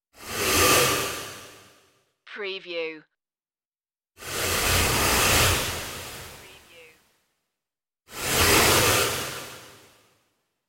wormhole enter swooshes

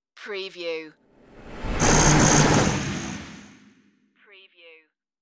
flutter wobble whoosh

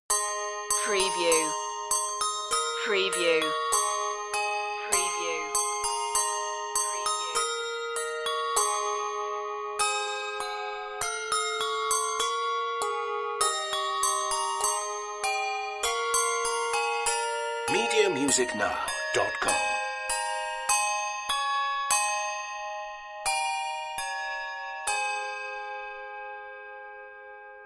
handbells

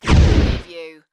Fireball; Action, Impacts & Explosions

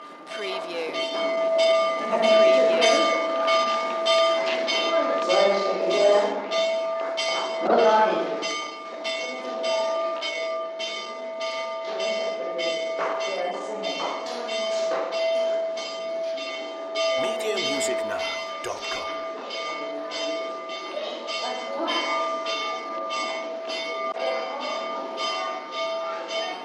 Fire Bell School Exterior

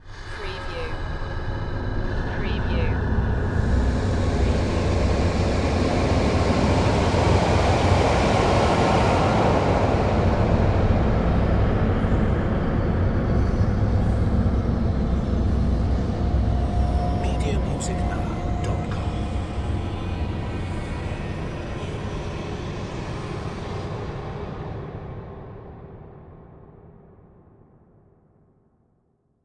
Swell Airy

